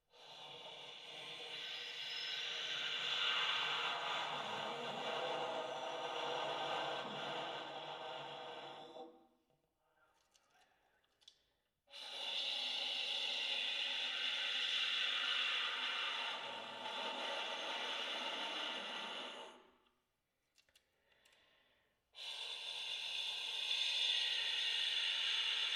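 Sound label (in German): alt, Luft-Ton, Übergang von hell nach dunkel auf drei Tönen: tief, mittel, hoch; »Tonleitern tonlos«; Übergang Luft -Ton auf einzelnen Tönen. Alt; saxophon; spieltechniken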